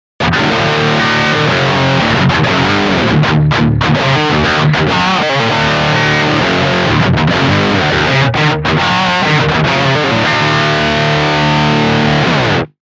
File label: lead, sparkle